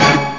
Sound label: hit